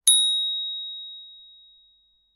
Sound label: ding, notification sounds; zulip